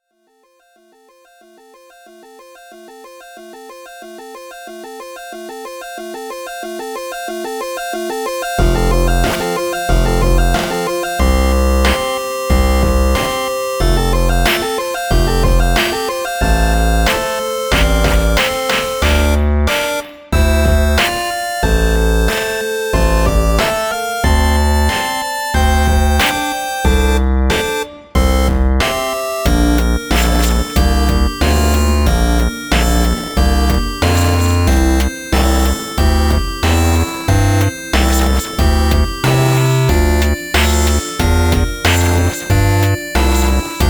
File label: Chaos Jig; Wandersongs Volume 1